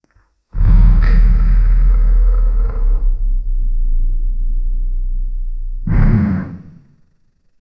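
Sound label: heavy stony monster walking, menacing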